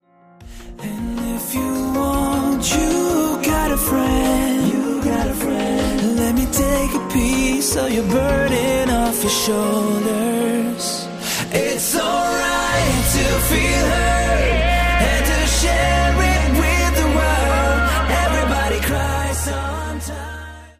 Everybody cries

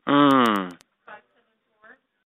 Hmm

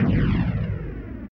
Start Game

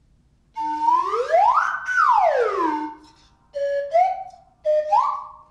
Whizz Whistle

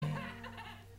Eheh heh heh

